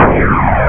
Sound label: BALL SHOT